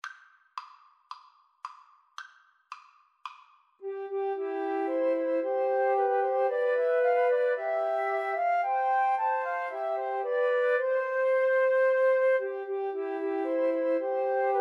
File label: twelve days, Flute Trio